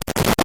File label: Glitch; Hornycraft